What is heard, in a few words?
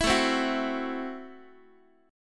A Minor 6th A, Guitar; Am6 strummed; x,x,7,7,4,5; 4A,4E,5A,5D,5G#,6C#